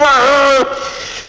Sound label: gunner eject; VM Viper